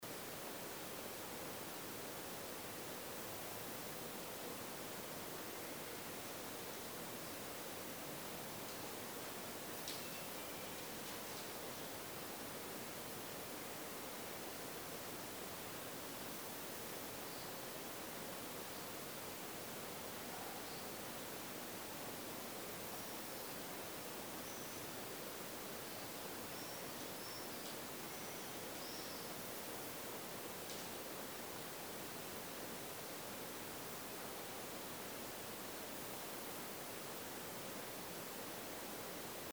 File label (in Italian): rumori voce passi rumori